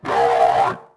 droids robots talk; gonk; Star Wars Obi Wan; XBOX

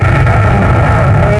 Bank vault door moving; Max Payne; subway

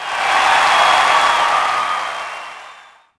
POS Reaction,Crowd; Cars; XBOX

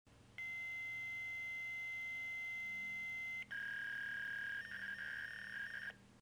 handshake; Soldier Of Fortune II Double Helix; XBOX; ambience; nyc

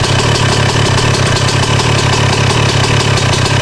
moto idle; terrains; motox; Tony Hawk's Project 8; XBOX

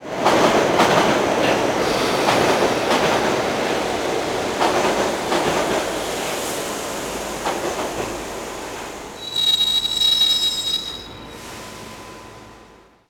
Subway Train Stops Interior; ambient

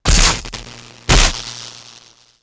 electric arc

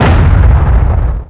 cannon fire; shipcharge; Pirates Of The Caribbean

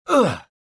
land; Star Wars Jedi Knight II Jedi Outcast; XBOX; bespincop

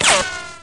Ricochet, Impacts

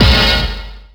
gap sound; Tony Hawk's Project 8; XBOX

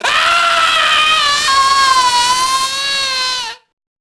junkie death; enemy; Max Payne

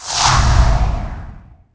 Hyper Space Enter

